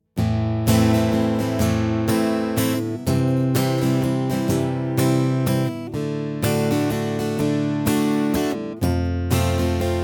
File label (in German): Anschlag, begleitung